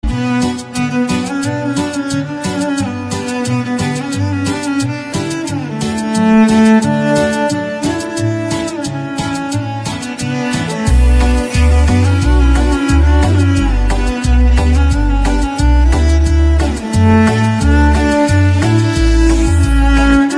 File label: cello